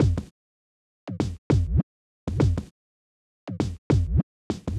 kicks; Multitrack

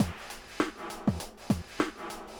drums

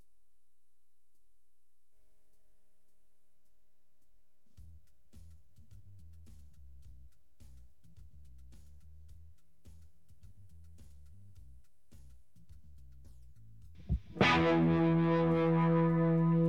guitar

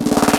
Snare FX

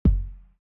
VA Kick; Remix Sample Pack

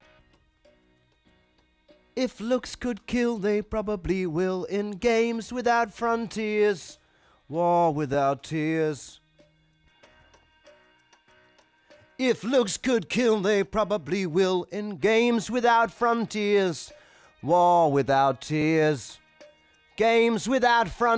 Main Vocal Chorus

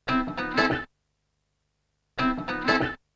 Guitar

